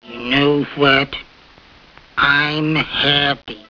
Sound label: happy; Droopy